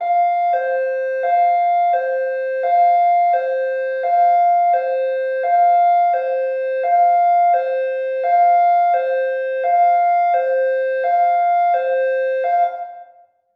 Emergency Patrol Boat Siren Individual Siren Docked; emergency effects; AdobeCC